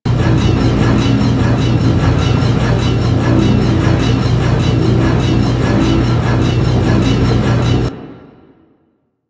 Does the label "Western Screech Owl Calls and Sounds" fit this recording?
No